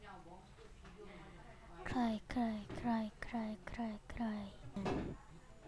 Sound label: cry